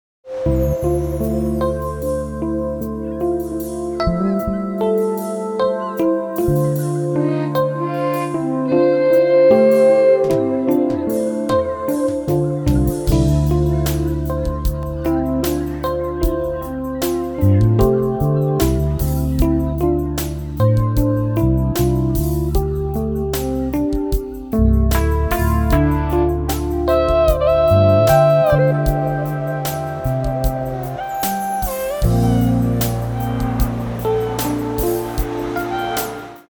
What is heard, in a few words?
groove